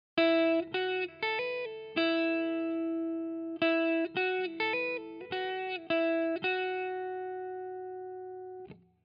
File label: les paul wet; chiqui audio